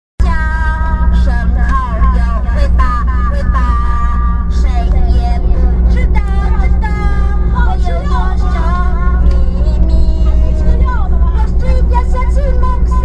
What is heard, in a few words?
road sing children